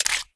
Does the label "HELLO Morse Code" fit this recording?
No